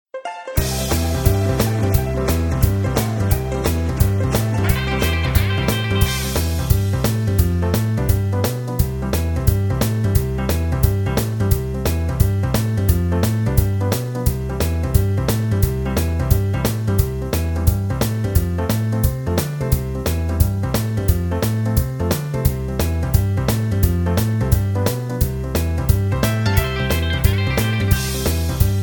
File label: C No Backing Vocals, backing tracks